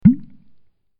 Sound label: sfx pop